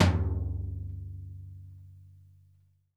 FLOOR, Drumworks; Sampleheads; New York City Drumworks VOL-1

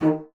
bassoon D#3; Xsample VOL-6; C BASSOON

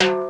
505 TIMBALE, TR-505; Hollywood Edge; The Big Bad Beatmachine

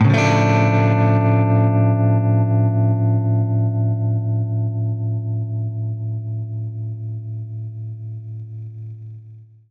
Baritone Chord Dmin[maj7], Guitar Chords

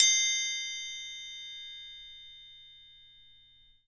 TRIANGLE; E-MU Producer Series Vol. 7 – Old World Instruments

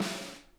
snare, drum kits; Sonic Reality; Interactive Drum Kits

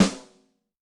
Blu, Yamaha DTXpress-II snare

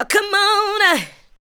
ADLIBS 90; Ueberschall; Jam Box

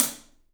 Paiste hat, drums; 3D Sound

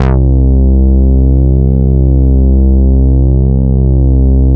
Oscar bass; Big Fish Audio - First Call Vintage Keyboards; OSCARBASSICS

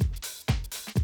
125, Classic Drum Loops

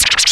Whirly Scratch; Mo' Phatt; Digital Sound Factory Mo' Phatt

